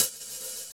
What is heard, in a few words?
Open Hhat, Hihat; Equipped Music; Loungin House